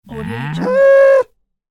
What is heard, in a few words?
angry cartoon cow